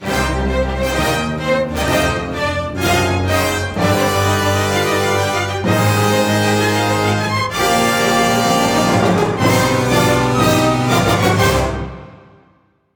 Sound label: Minor Win Fanfare